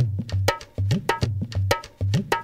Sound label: Dholki; loops